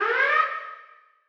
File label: Menu Warning